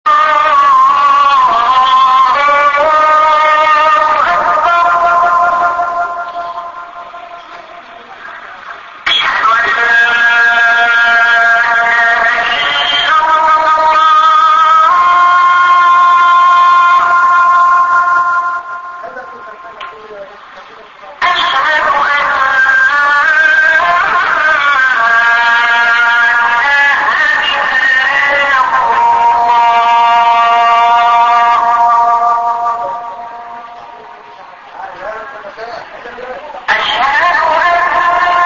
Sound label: azan, sidon